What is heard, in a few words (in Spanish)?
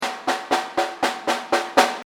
Caja Break